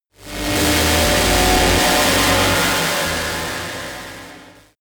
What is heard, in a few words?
sci-fi cosmic dark energy disturbance; horror